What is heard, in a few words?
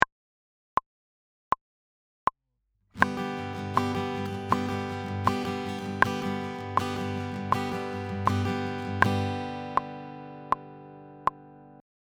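Double Speed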